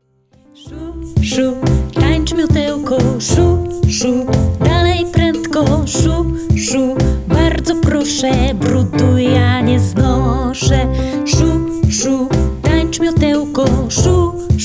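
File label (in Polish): taniec miotełek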